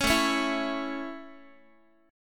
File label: A Major A Guitar, chord; 16,17,16,14,x,x; 4A,4E,5A,5D,5G#,6C#; strummed